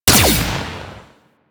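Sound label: Igniter Pistol Projectile Explosion; Warframe; Weapons; Corpus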